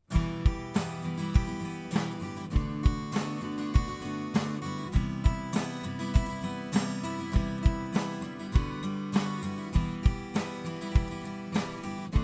AKG C 451B NECK MIX

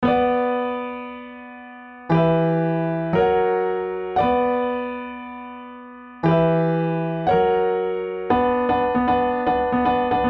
piano solo; arturia

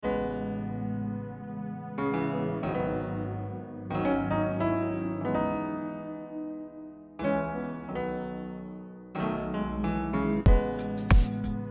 SSL 9000 EQ LINE

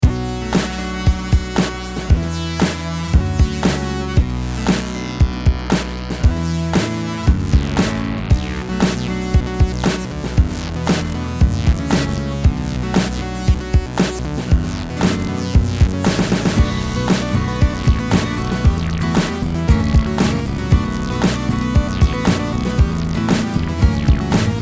FM OSC FILTER

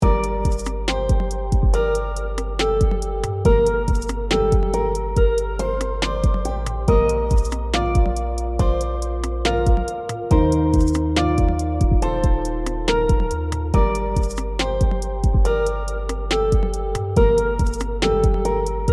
wa73 out open tone

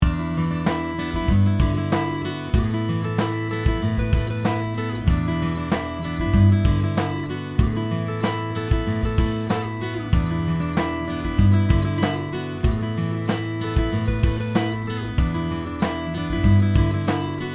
MXL V67G

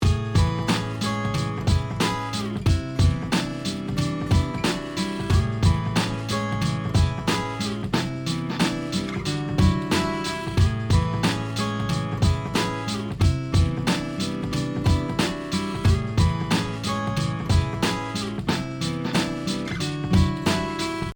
ROYER R121 BACK

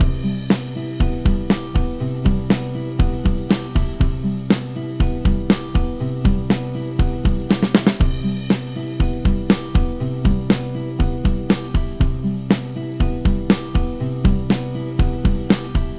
UAD 4000e

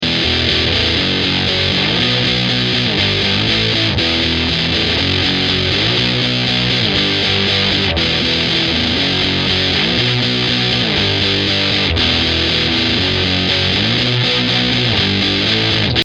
Fuzz

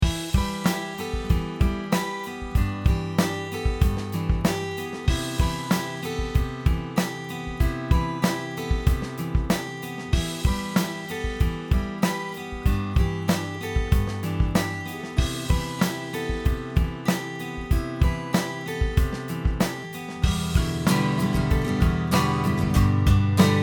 marantz Pro

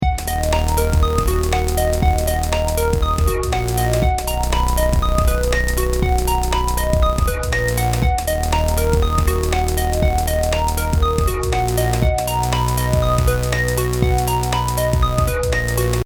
celesta

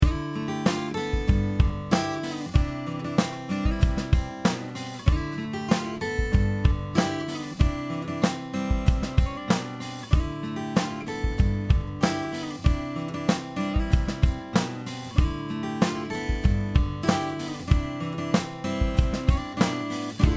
KNEE HARD

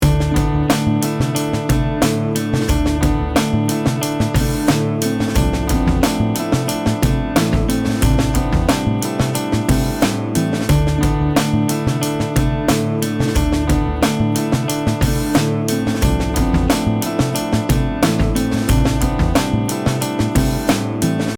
tone too hot

